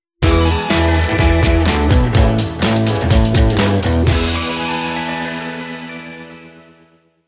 Groovy, Sting

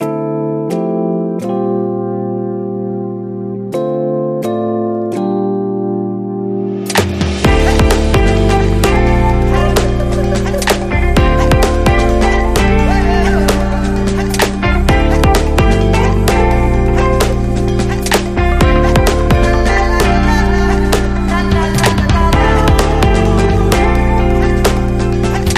Electronic Latin Party

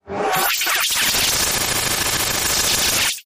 Sharp Flutter, Taylor Grand

